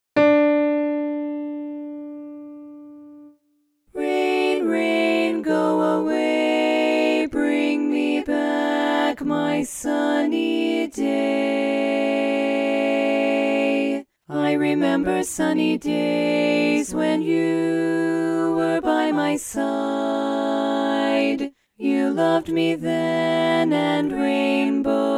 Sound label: bari dominant